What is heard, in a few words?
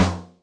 UnclAB, Electric Snare, Yamaha DTXpress